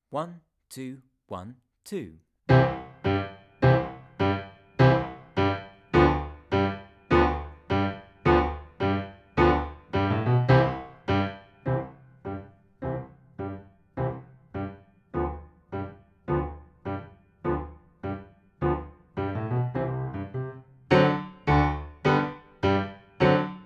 Mexican Hat Dance Playalong

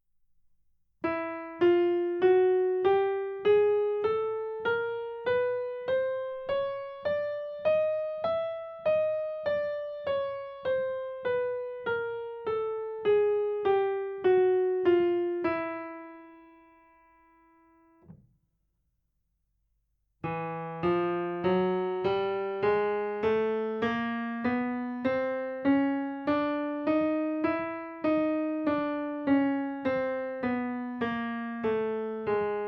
Chromatic Scale Exercise

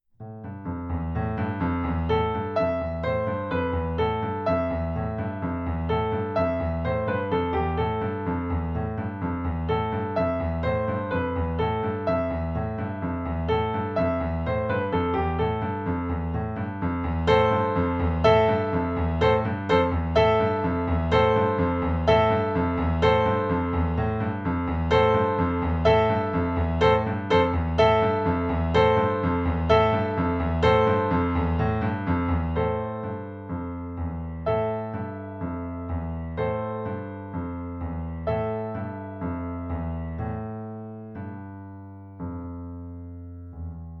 Basso Ostinato

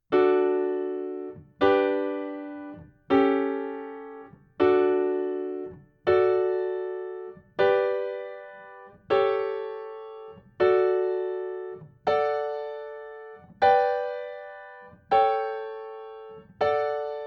Cadences in D Major Exercise

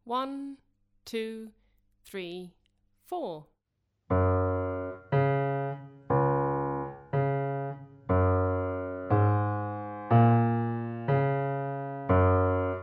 Duck Walk Playalong

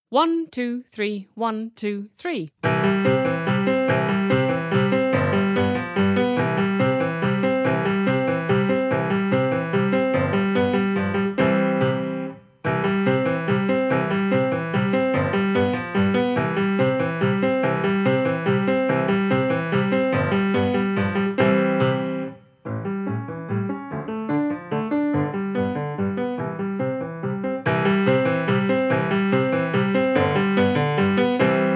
German Dance Playalong

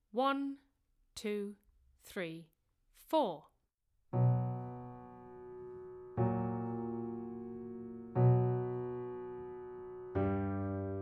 Serious Song Playalong

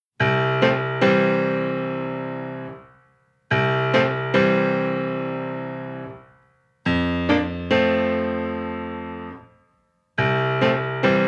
Rock That Beat Playalong

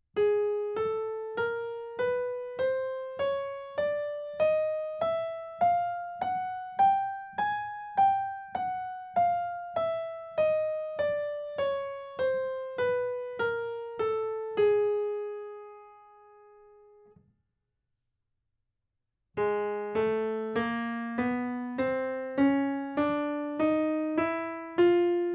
Chromatic Scale Exercise

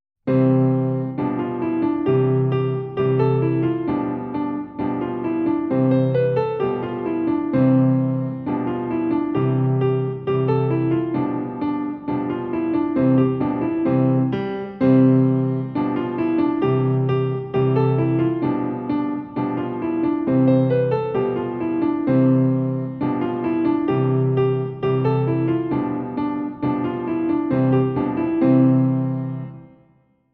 Cancan Minim Bass

